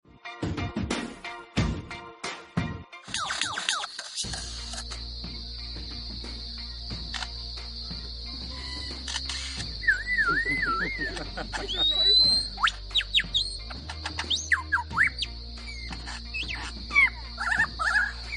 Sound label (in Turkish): Araba alarmini taklit eden Alayci Kus; hayvanlar